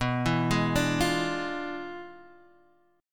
E 7th Flat 9th B, guitar

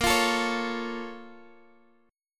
A sharp Minor Major 7th, down; strummed

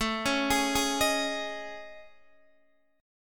A Major 7th Flat 5th, Guitar, Open D

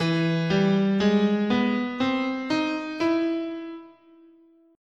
E Augmented, guitar, scale

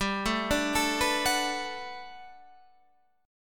B Minor 7th G, chord; Bm7/G arpeggiated

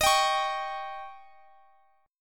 D sharp 7th D sharp, Guitar, Open F; D#7; strummed; chord shape; x,x,x,22,22,20

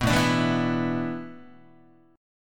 A Suspended 2nd Suspended 4th, Guitar; Asus2sus4 strummed

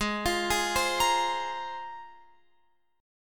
G sharp 6th Add 9th, Guitar, Open F; arpeggiated; x,15,17,15,15,17; G#6add9

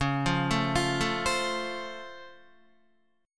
Db Augmented Major 7th Db down Guitar; arpeggiated; 4F,4A,5C,5G,5C,6E

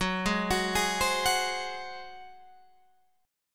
Gb Suspended 2nd Flat 5th Guitar Open G

